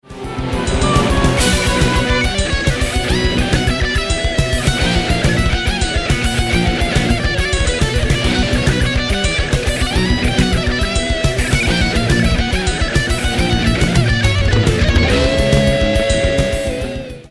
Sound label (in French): Guit Elec solo